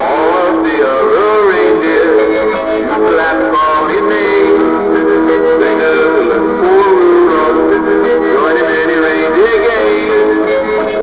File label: Human singing song